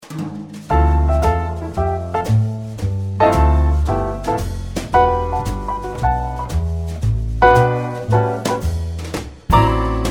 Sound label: jazz